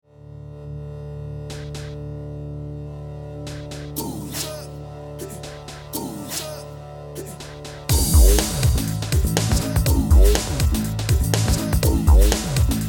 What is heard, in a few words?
AfrikVox